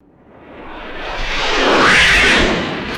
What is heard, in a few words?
deep airy rocket whoosh pass by; aircraft